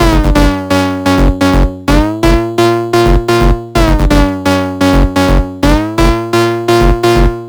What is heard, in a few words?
128BPM Melody; Vengeance Samples; VFH1 128BPM Northwood Kit